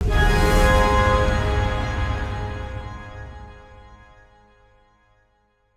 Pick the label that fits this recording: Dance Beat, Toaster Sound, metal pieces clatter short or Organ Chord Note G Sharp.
Organ Chord Note G Sharp